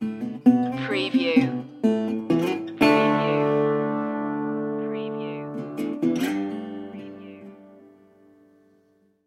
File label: sand and rust stinger